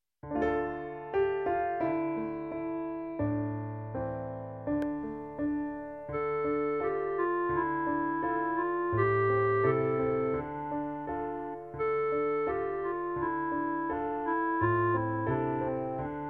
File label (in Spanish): CLARINETE